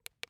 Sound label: Ui Click